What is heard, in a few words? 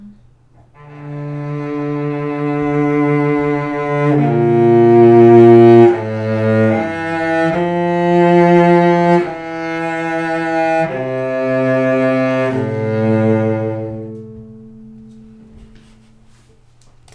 celllo real